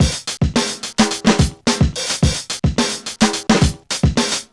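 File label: 108 bpm, Funky loops; Zero-G; Funky Elements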